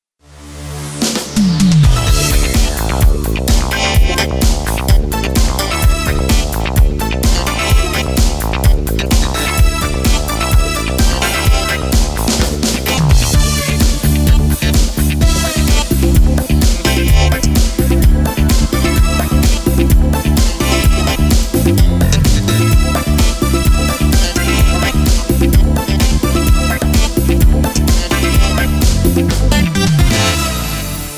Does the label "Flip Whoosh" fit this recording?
No